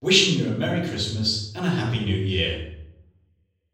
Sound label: sweep; xmas brir